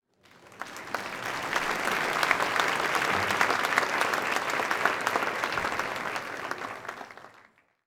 applause

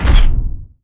magic

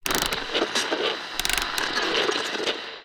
radio FM

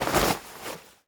cloth movement

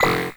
ui warning

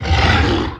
dragon bite

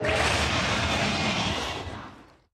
sever tail voice

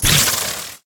throw

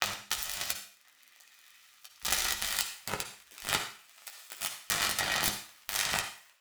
welding

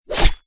water sickle

